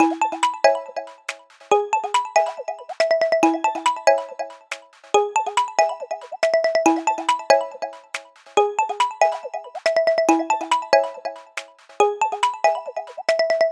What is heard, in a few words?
Upbeat; Ai+; Pulse